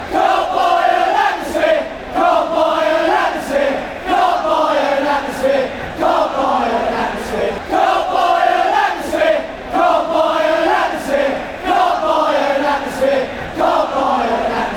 cant buy atmosphere, chants

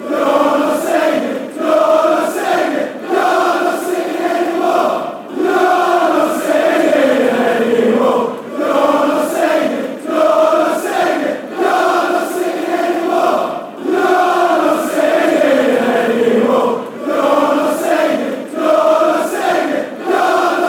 your not singing

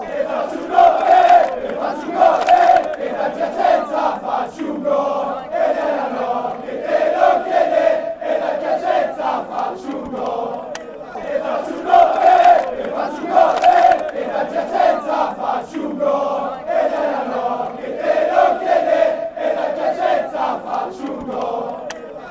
score a goal

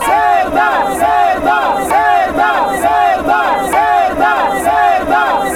sit down watch game

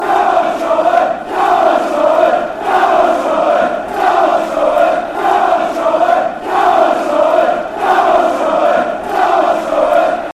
come charlton, chants

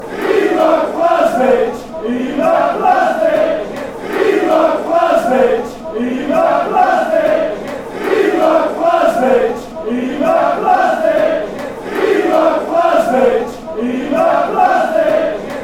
bolton chant

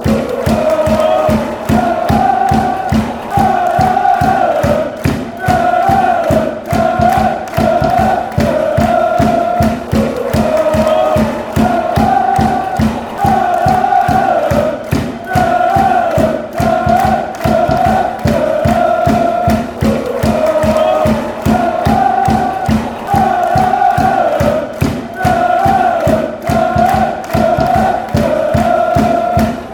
fan fair, chants

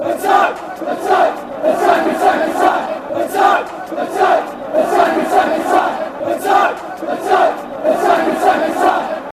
attack attack, chants; bwfc

